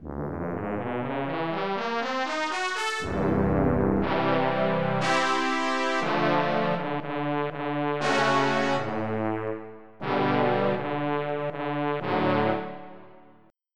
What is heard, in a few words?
Brass; FF7